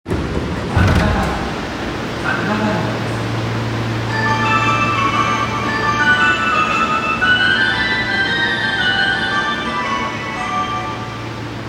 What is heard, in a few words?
train sounds; 有楽町線桜田門西